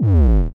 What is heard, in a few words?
Bass Drop; osu